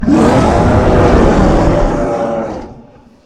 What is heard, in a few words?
Kong Roar